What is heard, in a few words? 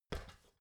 step ladder metal single; schritte